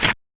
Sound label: Drawer Key Enter